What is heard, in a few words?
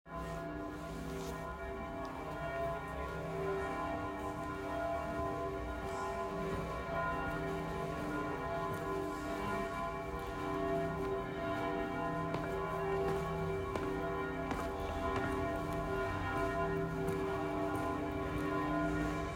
Bells; Crisogono